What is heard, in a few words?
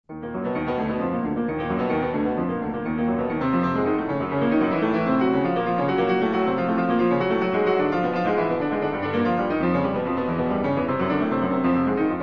Prelude Op. 28 - 14 in E flat minor